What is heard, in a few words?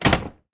crab Chest Closes; reef raider